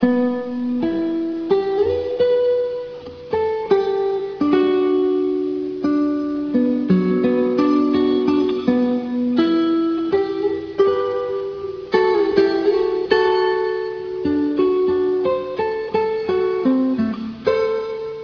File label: guitar